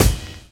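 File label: drum chop; AMG Gota Yashiki Groove Activator; GOTA GROOVES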